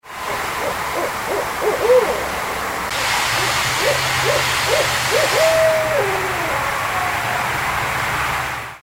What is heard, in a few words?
coyote amplified